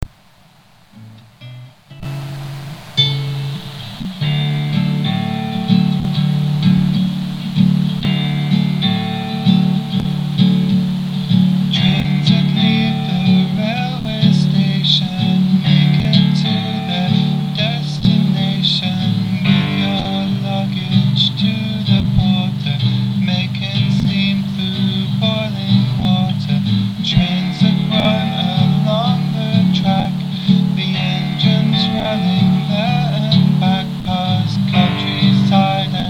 train song

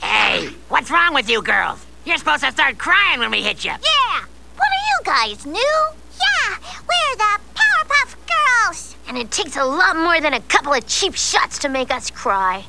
start cryin

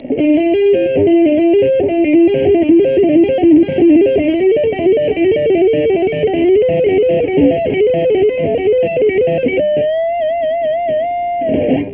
clone tap

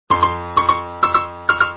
piano

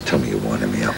wind up

